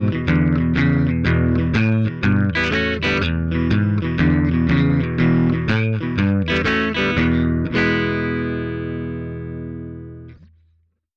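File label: guitar di clean, behringer vtone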